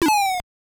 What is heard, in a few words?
Opponent throwing Swift Punches; Mike Tyson's Punch-Out!!